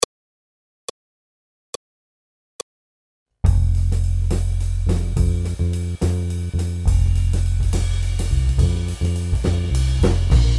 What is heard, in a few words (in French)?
blues Accompagnement seul; Jazz